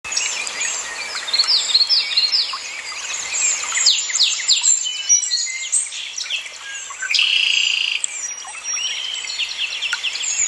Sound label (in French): chant d oiseaux; Etude détaillée des acouphènes